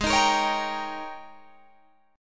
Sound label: G sharp Major 7th Suspended 2nd Suspended 4th Guitar Drop D